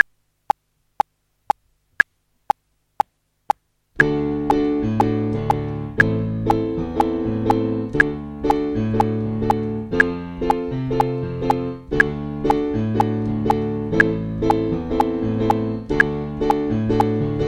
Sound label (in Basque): pianoa